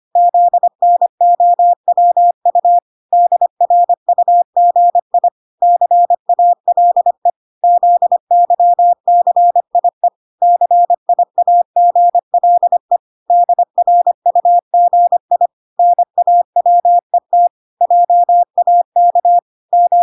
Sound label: 25wpm